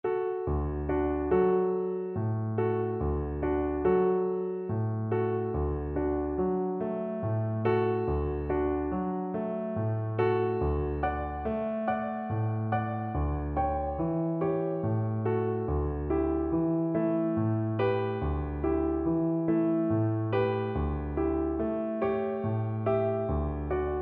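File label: lullaby VLC karaoke, Cello; brahms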